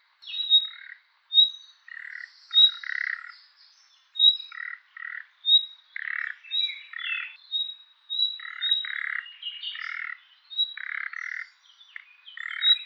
call, Nightingale, The threat / warning call (see spectrograms) is uttered usually near the nest site. Background: Blackcap.; luscinia megarhynchos